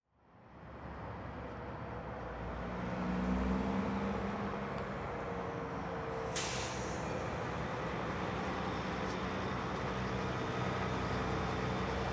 Ambience Traffic From Parking Garage; AdobeCC

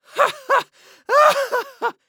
Human Male Laugh Out Of Breath Chuckle

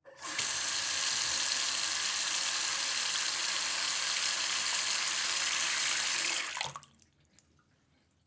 Sink Bathroom Sink Turn On Shut Off, Household

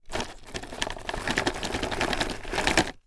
Wood Chips Plastic Tub Rattle; foley

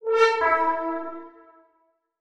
Title Transition Logo; Adobe; Production Element

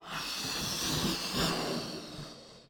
Cartoon Balloon Blow Up

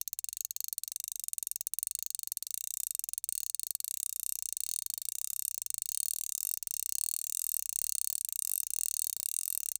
Sports Fly Fishing Reel Backwards Slow

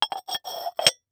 Household Jar Glass Jar Close

